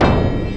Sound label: WORK TM, Industrial; USB Soundscan vol.24; AKAI